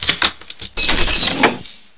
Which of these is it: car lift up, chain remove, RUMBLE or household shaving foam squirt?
chain remove